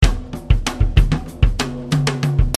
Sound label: Eight Patterns